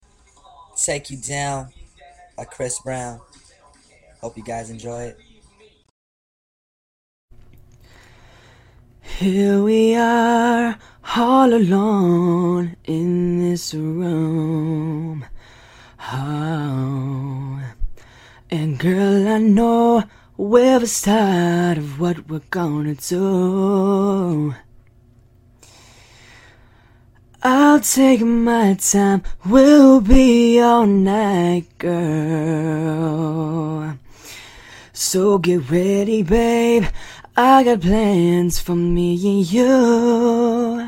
me singing

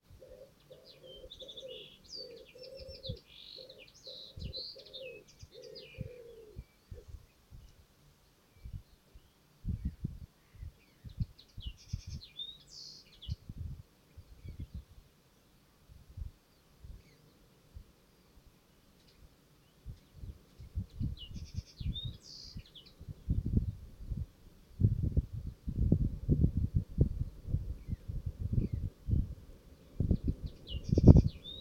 linnet on orford ness national nature reserve

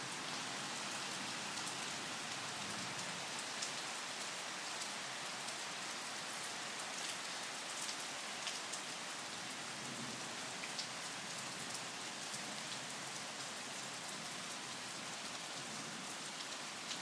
thunderstorm at midnight

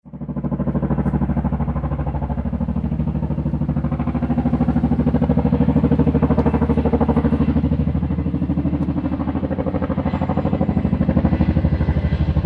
soundscape the return of the chinook

